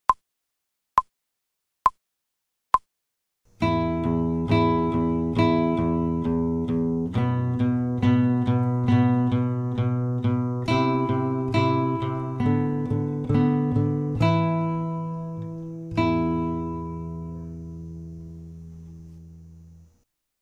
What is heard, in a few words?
slow, guitar; without metronome; Almost Late